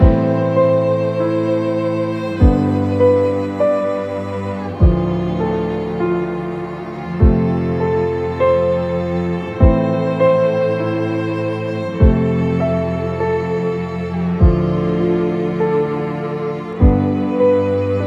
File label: dramatic; by burak sound bank; dramatic dram vol 11